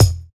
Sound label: BD; Best Service; Dance Mega Drums